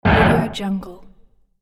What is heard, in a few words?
distorted smash impact short